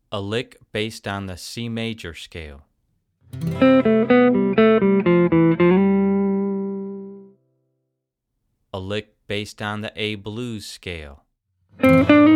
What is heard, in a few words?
Musical Examples Of Scales